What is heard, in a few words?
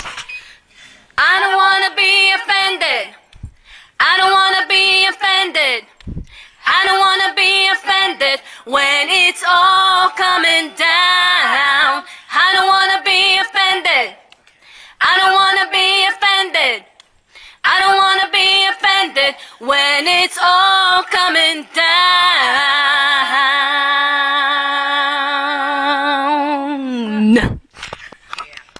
baptize alto